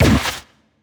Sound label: CARRIER spike Hit